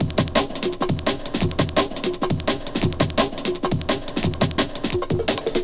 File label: Drum & Bass Beats & Breaks; Sonic Foundry (Sony Creative Software) - Fluid Dynamics Drum & Bass